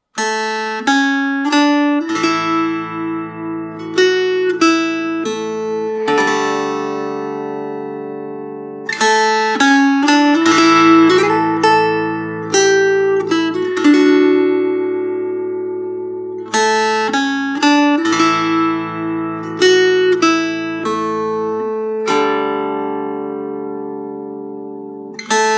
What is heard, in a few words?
Baritone unplugged